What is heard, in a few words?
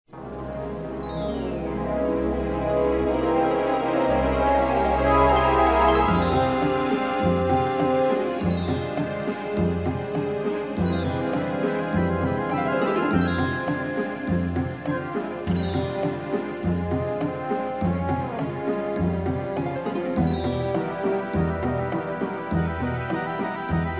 jungle